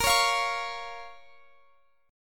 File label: Bb Minor Bb down Guitar Drop D